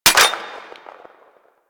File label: Gorgon Fire Mech Single, Weapons; Warframe